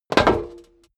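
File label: step dress boots metal dry jump, footsteps; schritte; menschen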